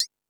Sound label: FX Sounds, Drum Machines E-MU Pro-Cussion